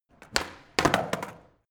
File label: skateboard indoors kick